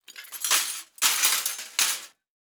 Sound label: Impact Silverware Picking Up And Placing On Tray